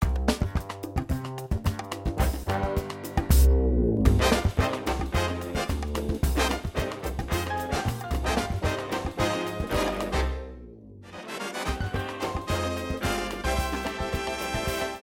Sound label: Music; BornAgainVirgin